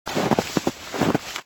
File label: Reload snow